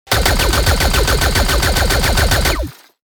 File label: Burst Cannon; Warhammer 40000; Tabletop; munitorum Volume I Sound Effects